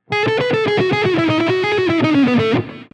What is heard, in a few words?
lick, 80 bpm, guitar; Lick 1: Three note-per-string shape; Here's how it sounds at 1/2 speed.; triad substitution